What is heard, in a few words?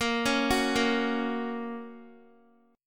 Bb Minor Sharp 5th Bb down Guitar; Bbm#5 arpeggiated